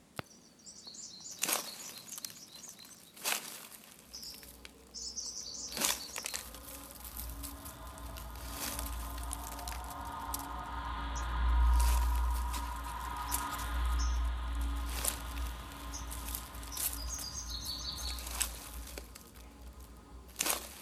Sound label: SOUND SCAPE